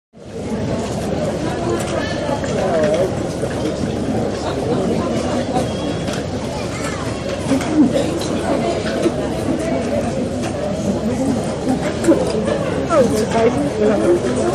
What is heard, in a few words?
Airport Air, int airport; Airport Heathrow Air